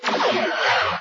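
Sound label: rocket launch sound in computer game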